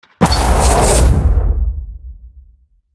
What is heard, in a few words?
Rocket sound